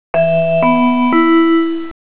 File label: gong up